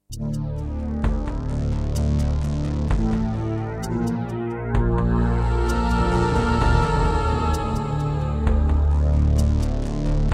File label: Pine PT-202 Vivaldi Wavetable DB; Descent II